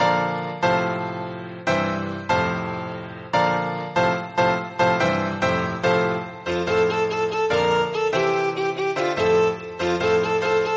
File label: lovely tone tum tum